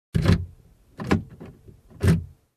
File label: Unlocking Car with key